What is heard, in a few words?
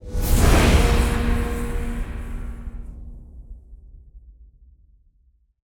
Level Up; Regions of Ruin